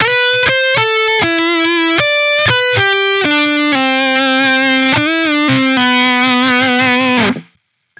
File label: Guit Solo, guitar